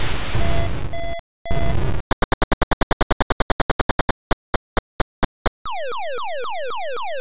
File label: missile command